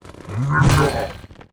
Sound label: BLAZE BLOCK WAR ATTACK